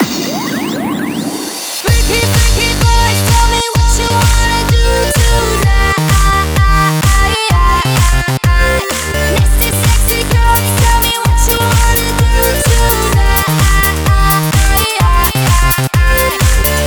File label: Female Mix, 128 BPM; Vengeance Samples; Vocal-Hook Kits; Song Kit; Freaky Boys